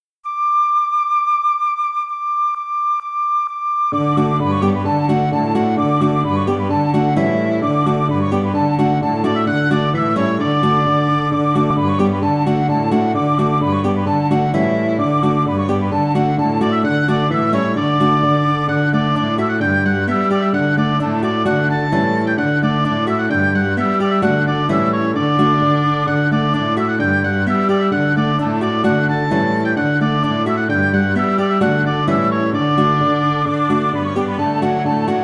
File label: polka